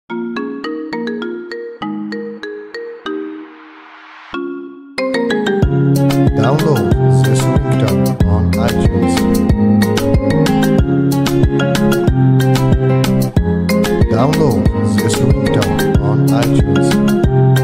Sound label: Marimba